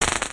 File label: Short Shock